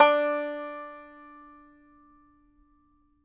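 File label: banjo D4 open, banjo notes; Sampleheads - Whole Lotta Country 1 & 2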